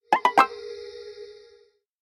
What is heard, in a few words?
Notification Sound For Nothing Phone